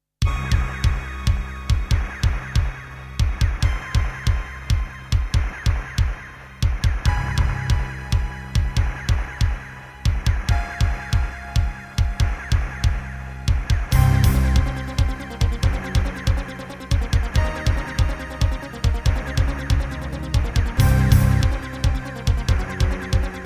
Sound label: Yamaha TG100; sigil doom episode 5